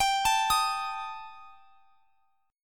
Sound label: G Suspended 2nd Sharp 5th; Gsus2#5; arpeggiated; x,x,x,24,22,23